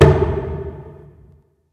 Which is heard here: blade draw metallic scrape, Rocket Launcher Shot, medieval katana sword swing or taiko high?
taiko high